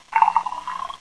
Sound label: pour; physioex9